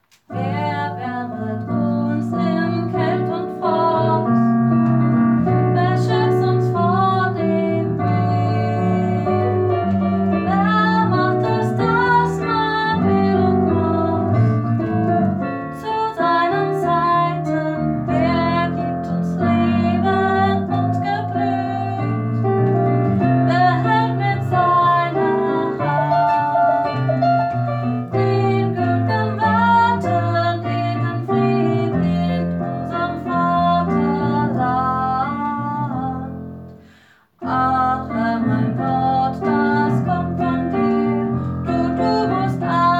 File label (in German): Ich singe Dir Alt; Chorfest der EKM auf der BUGA am 26.06.2021